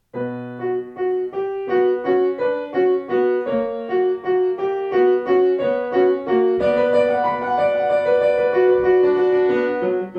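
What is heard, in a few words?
Bach Sinfonia, Yamaha Klavier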